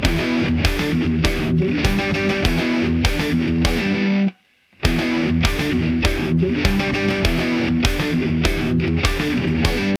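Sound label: jingle rock radio with guitar; instrument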